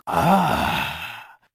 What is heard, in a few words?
ahhh